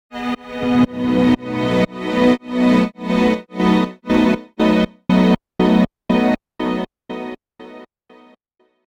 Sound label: strings sym